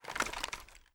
sniper deploy; svencoop; weapons

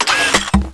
pump forth, M41A; weapons; svencoop

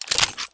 revolvers wheel out; svencoop; weapons

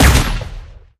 chaingun fire; weapons; svencoop

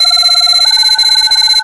mine charge; weapons; svencoop

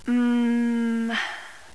hummm; svencoop; azuresheep

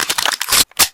pistol reload, weapons; svencoop

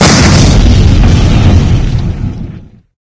explode; svencoop

